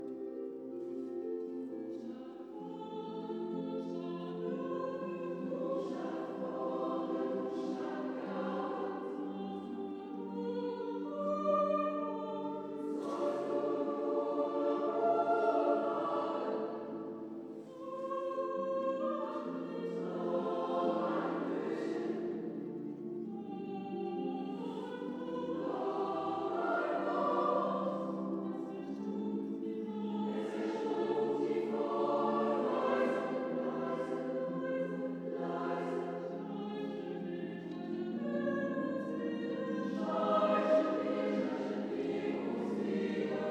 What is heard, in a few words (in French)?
avec harpe; Schubert; Ständchen